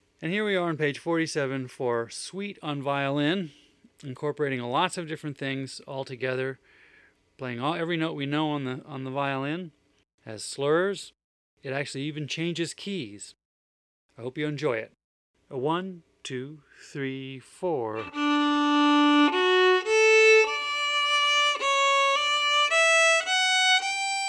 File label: Violin Slow